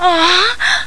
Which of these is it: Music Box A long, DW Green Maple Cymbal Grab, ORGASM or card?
ORGASM